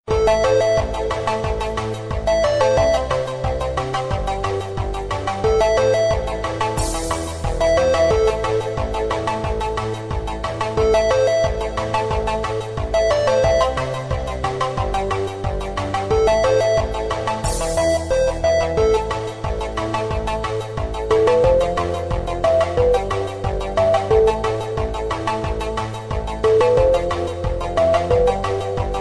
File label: Cobra Tone